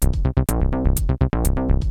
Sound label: EURO-DANCE; Rubber Chicken Software Sample Bank